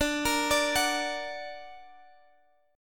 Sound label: D Augmented D down Guitar Standard; D+ arpeggiated; capo=3